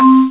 Vibes Ding; PC; MARIOSFUNDAMENTALS

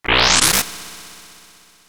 enemy mega drain; SNES; SMRPG

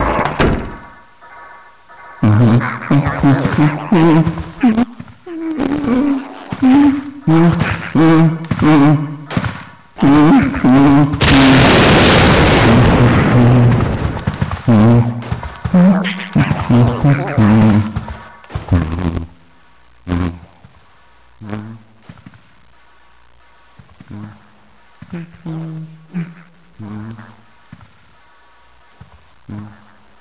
Humming; GAMECUBE; LUIGISMANSION

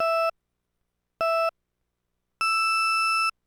race start; SNES; SMK

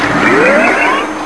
fludd charge; GAMECUBE; SUPERMARIOSUNSHINE

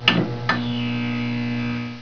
Power Turn On; PC; MARIOSFUNDAMENTALS

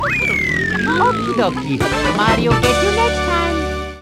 finish time trial; GAMECUBE; MKDD

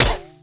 Kick; PC; MARIO TEACHES TYPING 2; Koopa Troopa

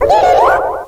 odododo; DS; ML BOWSERS INSIDE; Fawful

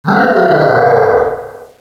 gwarrgh, Echo (inside caves, underground ect.); DS; ML BOWSERS INSIDE

